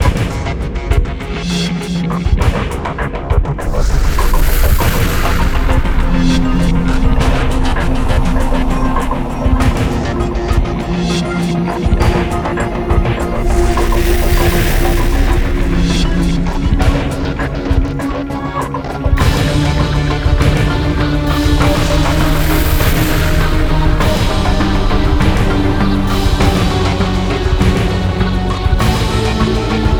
Musik Geiser Exploring

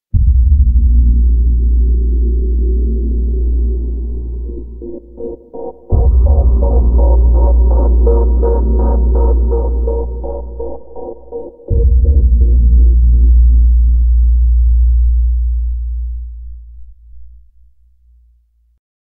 Suspense Theme

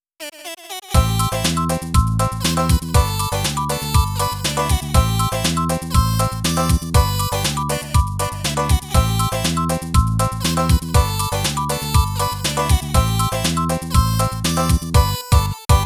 Play a Banjo and a Kazoo

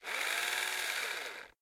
Door light

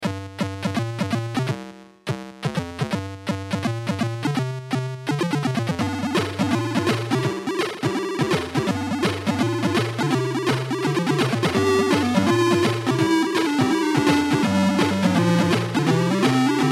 victory; Kwik Snax; Atari ST

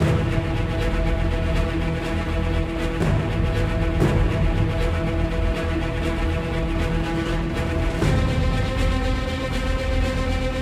Combat Boss Level 2; BIOMUTANT

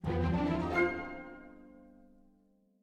Music Intro End 108bpm

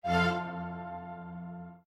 stage complete; magic flute by mozart

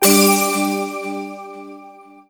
Level Clear; The Bug Butcher

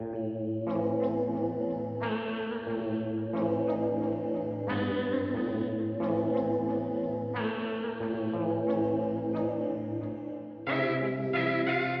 164beats 90bpm

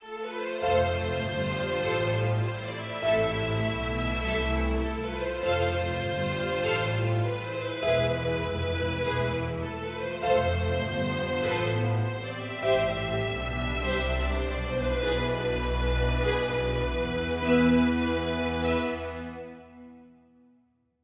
adventure forte

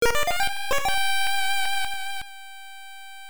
Failure Jingle; Pop'n Music GB Disney Tunes

